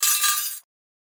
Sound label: tin cane